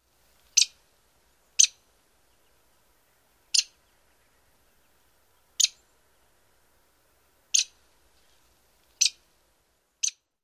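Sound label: Lewis's Woodpecker, call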